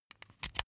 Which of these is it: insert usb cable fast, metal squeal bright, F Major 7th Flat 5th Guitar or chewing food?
insert usb cable fast